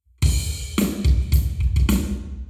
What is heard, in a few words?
Hall Drums 90deg